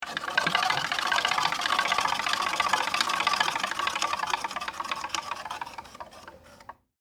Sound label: Wood Machine Spin, Foley, Wood, Machine, Drum, Spin, Wood, Clicks; Machinery Sound Effects